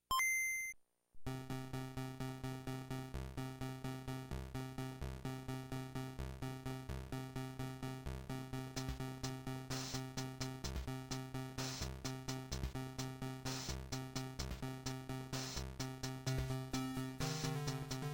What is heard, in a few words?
Boss Fight; LSDJ